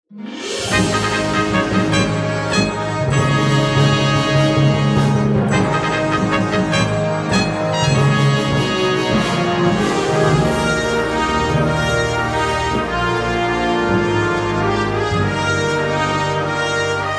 Fanfare

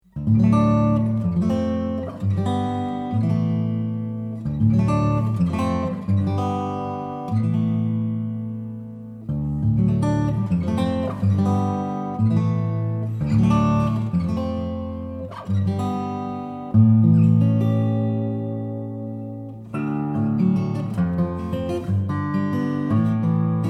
Chords For Guitar

